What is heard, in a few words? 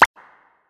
Bubble Pop